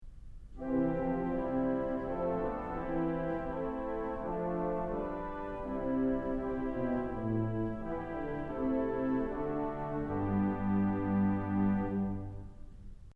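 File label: Gamba Celeste, organ stops; Kellogg Auditorium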